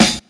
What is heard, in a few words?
00s clean acoustic snare a key, Royality free snare sound tuned to the A note